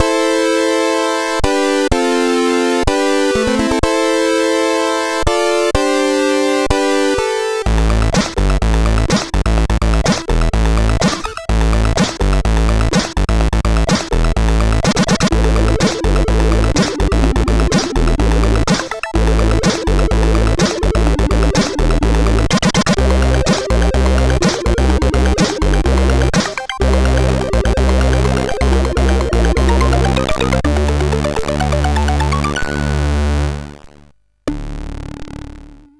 Jingle, CSG8580R5; Die Hard-Forum 64

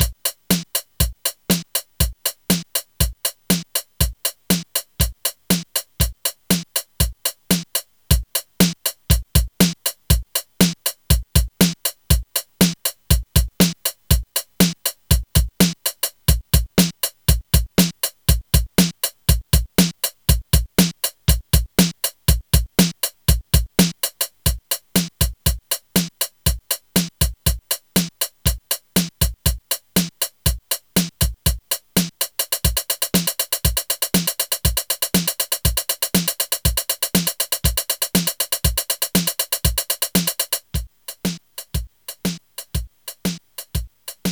drum beats; Music-X

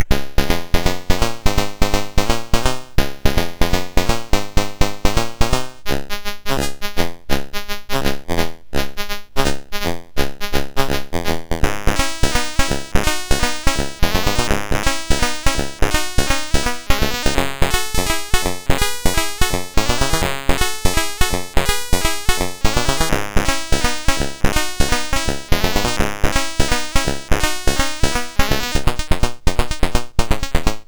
MOS6581R4, sid; Arc of Yesod

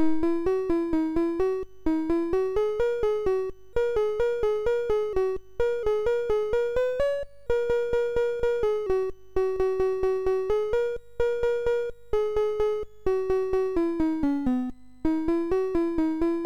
sid MOS6581R2; Orbitron

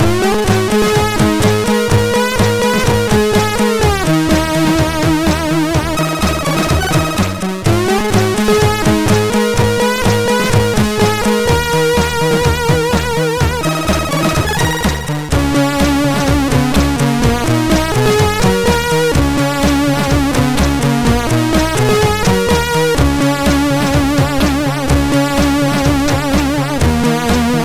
Intro, sid, MOS6581R2; Midas

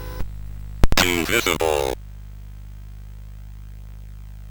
sid CSG8580R5

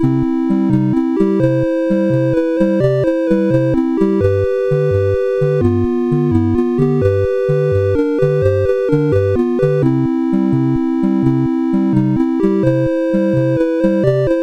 sid CSG8580R5; Pitfall II-Lost Caverns

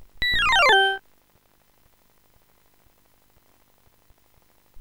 slide; Sonix Music Driver

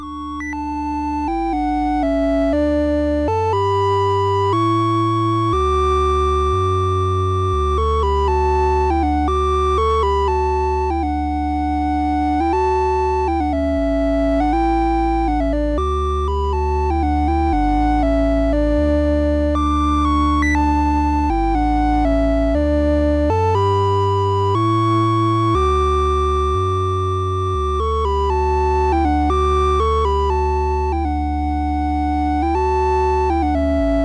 Christmas Music, MOS6581R3

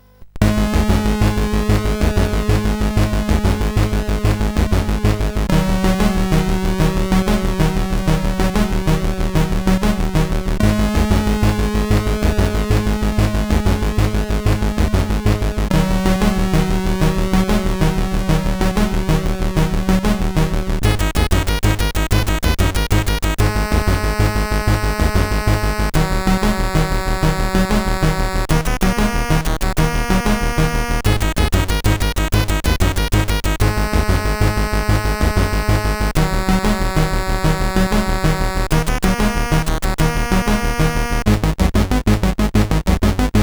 sid, CSG8580R5; Bee Insect Attacking

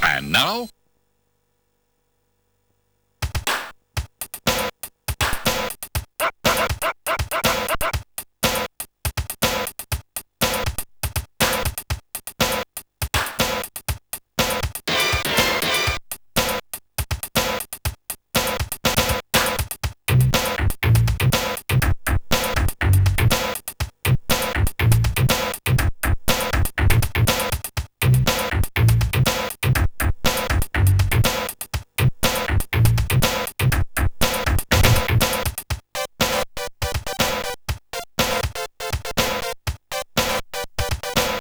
hip hop

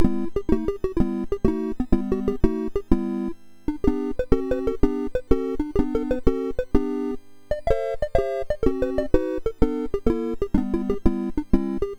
sid MOS6581R4; GAMES; Bear George

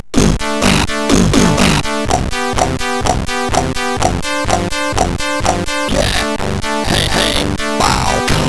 Voicemonitor MOS6581R4

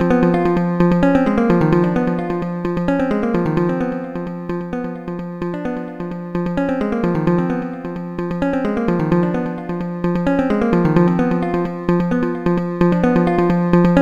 tb303; Music-X

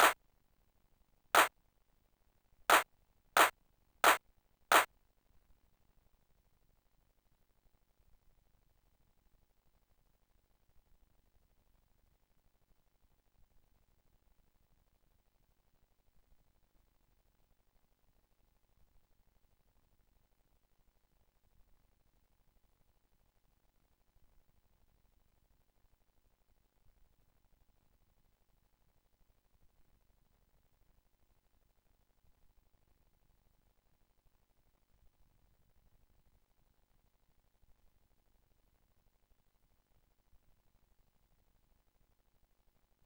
drum; Deluxe Music Construction Set

